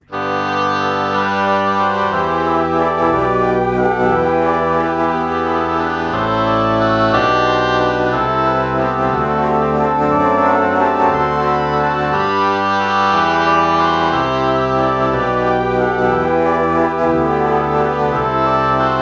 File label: alto flute oboe morph